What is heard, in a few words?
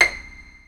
SOLOPIZZ, Strings